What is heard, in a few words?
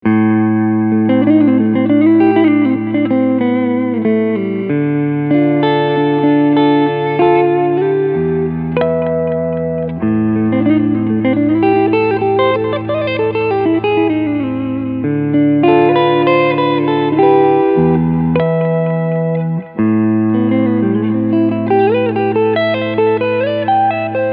Cleansound Front HB